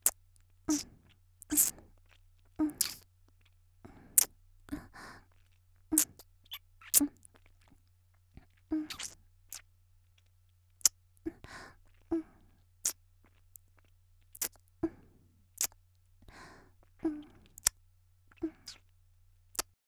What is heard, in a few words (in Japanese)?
「キス」普通のキス; 「キス」普通のキス20秒; 綾波系少女